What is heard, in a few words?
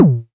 Kick Starship